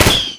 fireworks launch

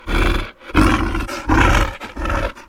crawler idle; shadows

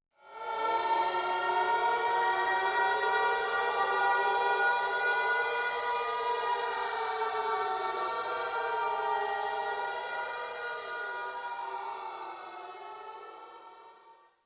siren amb fade

